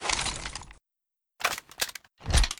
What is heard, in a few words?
reload fast; gmod; masita resources workshop content